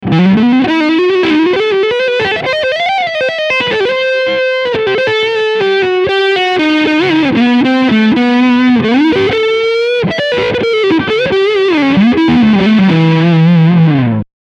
Leadsound Front HB

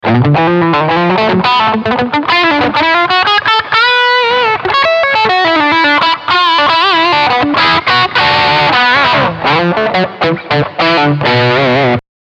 Crunchsound Steg HB

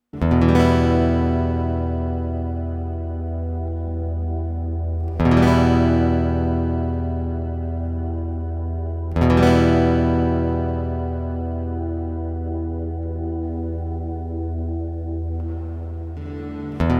Gitarre SW HW

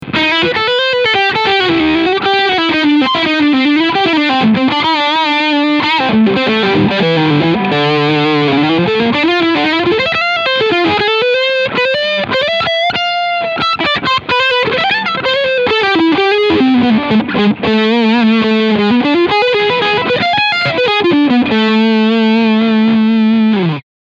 Leadsound Steg PU

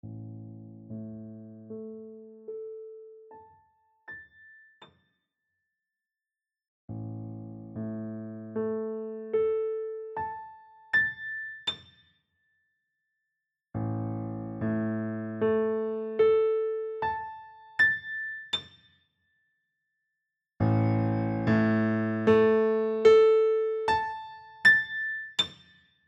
A leise bis laut nur Taste

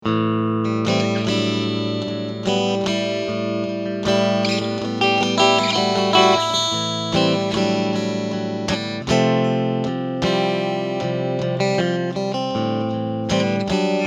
Beide Singlecoil Clean

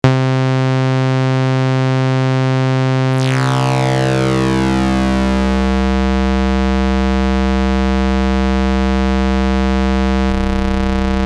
Korg NTS 1 Sägezahn

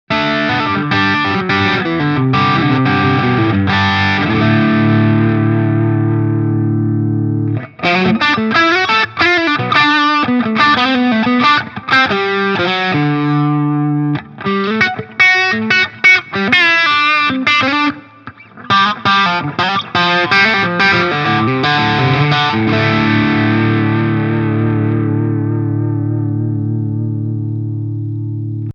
maximales Gain; Prince of Tone

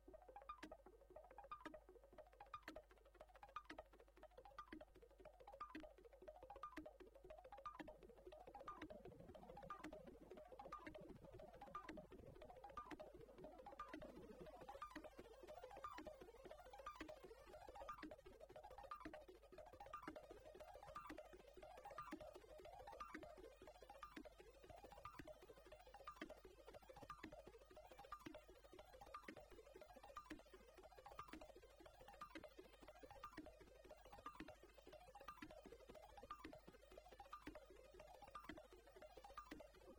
Sequenzer

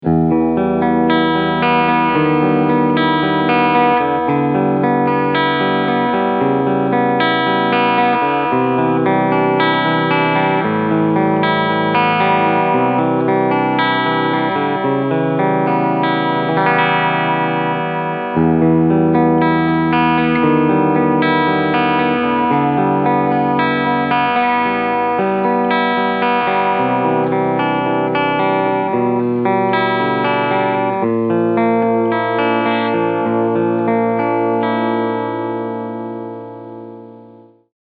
Cleansound beide PUs

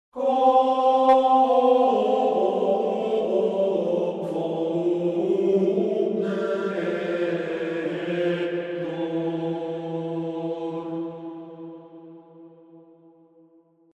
Absetzen bei neuen Silben

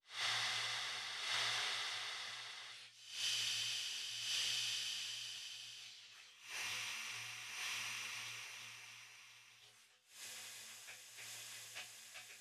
Elements rote Sektion Geometry Damping Brightnes

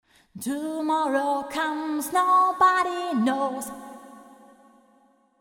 TC ClassicVerb PCM91 lang

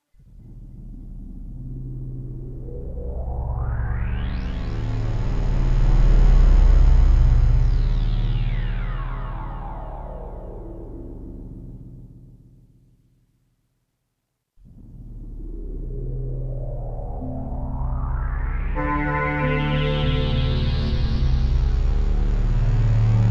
Rechteckwelle Breath kontrolliert die Cutoff hohe Resonanz

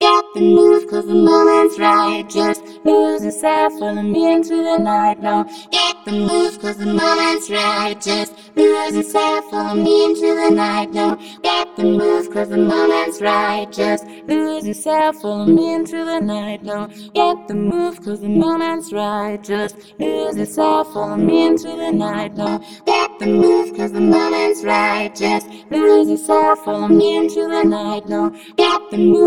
Orange Vocoder Synth Presets Auswahl; Zynaptiq

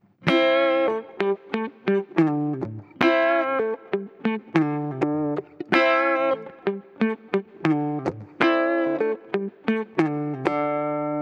Spaceman Artemis Touch Wah mit Compressor

